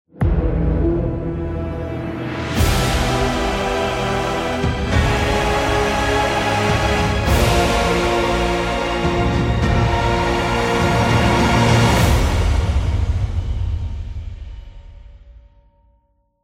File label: lose; radiant; valve 2021; stingers